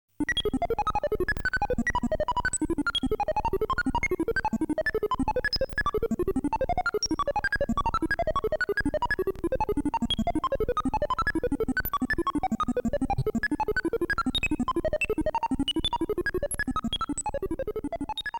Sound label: Level BGM; Game Sound Museum Famicom; Donkey Kong Jr